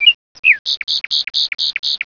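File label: NATURE, bird; 鸟; animal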